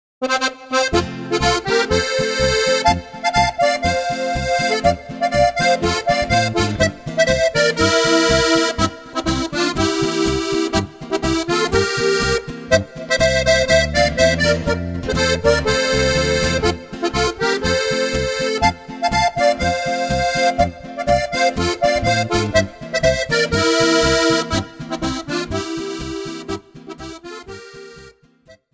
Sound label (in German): polka rhytmus